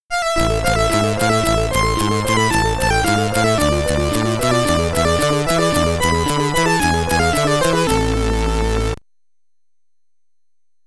Symphony No. 40, First Movement (SID Stereo), commodore 64; Austria